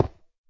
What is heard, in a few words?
stone, step; eaglercraft-b1.3